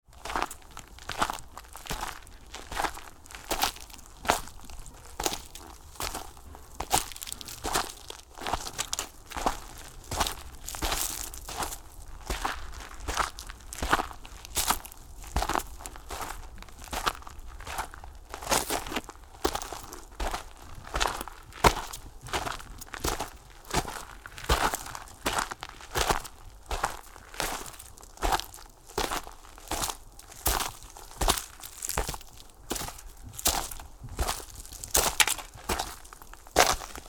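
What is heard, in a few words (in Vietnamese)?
Tiếng bước chân đi trong bùn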